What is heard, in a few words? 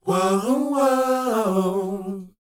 WHOA, Vocal, R&B & Dance; ILIO; Vocal Planet